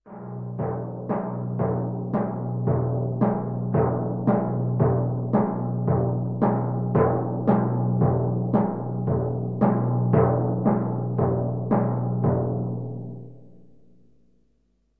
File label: Tympani Ram Beat; KMP Film Video Sound Effects